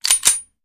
Hand Gun Foley; Sound Ideas; Guns

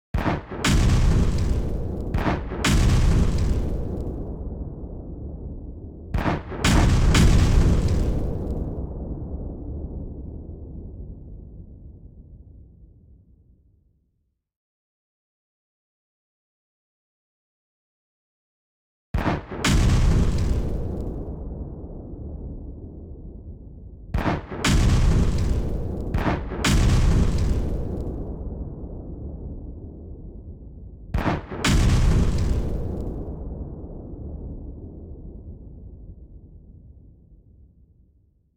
Sea Main Deck

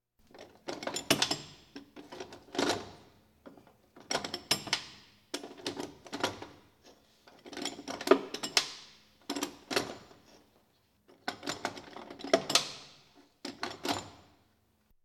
Police, Fire engines

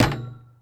Street Ball Glass Back

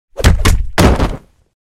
Punch-Body Fall Combo; Hollywood Edge; Martial Arts and Human Impacts

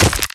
Melee Physical Medium; Kilta

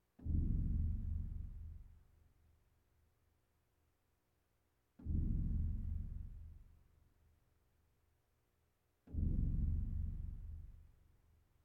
Percussion Bongos Tympani rolls Gongs Drums

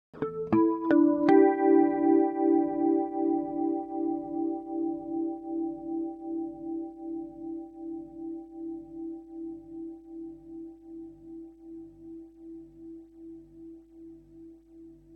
Electric Guitar Harmonic Arpeggio With Flange; Hollywood Edge; sound designer's toolkit v2

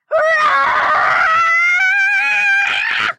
boomboom scream; goon; Blind Drive

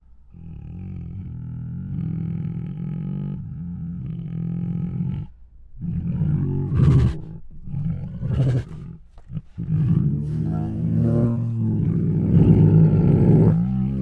Hungry Groans, Huffs, Moans and Snores, Tigers; Sound Ideas

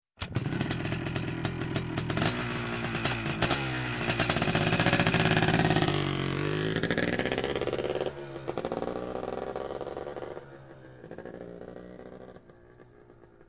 Motorcycle, Dirt Bike Start, Pull Away; Sound Ideas; Series 2000

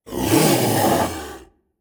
Zomby Stressed; Blind Survivor

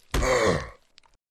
zombie hit

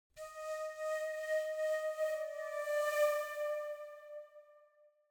Flute Tone - Slow Pulsate Semitone; Hollywood Edge; sound designer's toolkit v2

